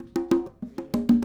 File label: Congas, 70s Analog Funk